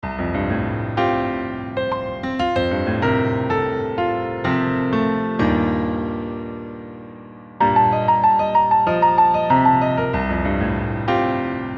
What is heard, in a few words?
some piano chords; weeklybeats-2020